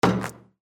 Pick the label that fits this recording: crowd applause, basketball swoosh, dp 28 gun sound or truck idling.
basketball swoosh